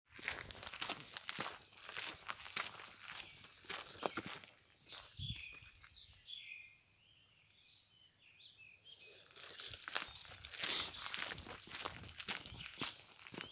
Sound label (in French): petit oiseau pré